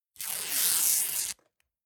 Fabric Tape Cloth Long Light Tare Rip High Pitched; Ripping Sound Effects